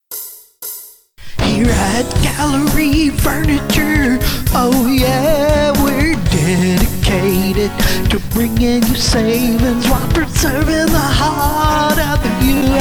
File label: music male; Andy's Hawg Wild